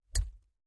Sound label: Human body parts being chopped or amputated. Mutilation, Body Dismemberment, Gore Chopping, Flesh